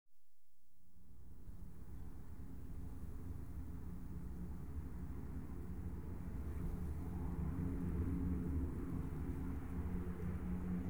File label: TAKE OFF AND DEPART, Aircraft; The BBC Sound Effects Library